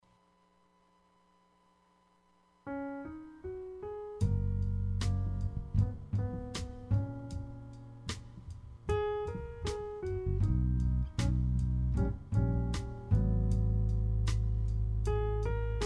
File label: jazz